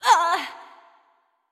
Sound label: Prone; Jackie